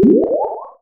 Retro Blop Stereo UP

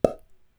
pop; abstract; Free Sound Assets

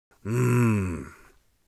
satisfied, warrior voice; Warrior Voice Pack

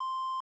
beep, 8 bit sound effects

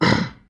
monster; creature

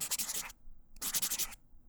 Thicc Tip Scribbles, writing; Books, Paper, Writing

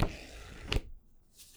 Riffle Pages, books, paper; Books, Paper, Writing

